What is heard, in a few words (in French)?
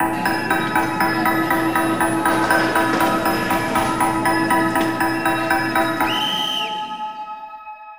Musique narration; DEVOIRS; sound design